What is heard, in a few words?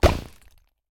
Task Complete Small; Going Under